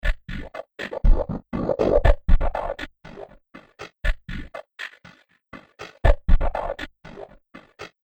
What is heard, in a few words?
120 Bpm Breaking The Drums Loop; Royalty Free Drum Loops; Percussive And Rhythmic; Seamless loop